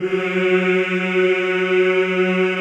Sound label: F#2, Mixed; Sound & Vision - Gigapack I CD 2 (Roland); Mix-Combi 2